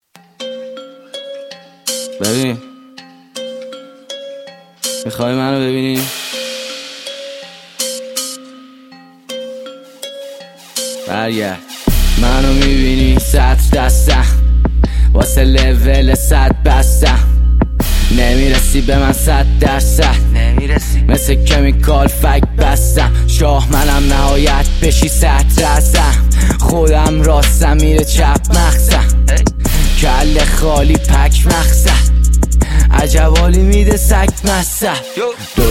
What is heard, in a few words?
noise up sad 128